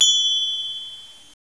CLINKING; BELLS

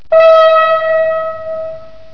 HORN

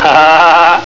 ha ha

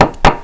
KNOCK

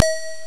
DONGER; BELLS

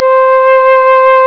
ELECTRI

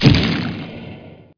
EXPLODE; JOHNNY

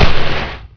FLING

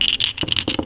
SHUFFLE, Domino; DominoDX

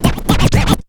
SCRATCH; BEAT2000

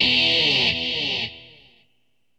GUITAR, ROCK POP; MAGIX

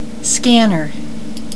SCANNER

